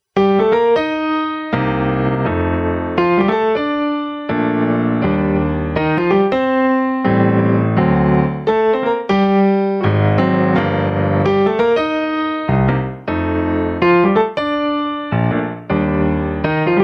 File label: piano